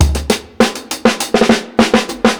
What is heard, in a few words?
60'S STYLE 100 FILL, DRUMS LOOPS; BASEMENT DRUMS VOL 2